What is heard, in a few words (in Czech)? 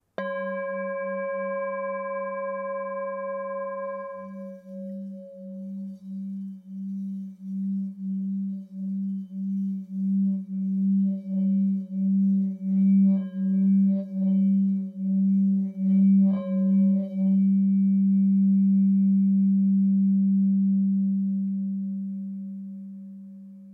tibetska misa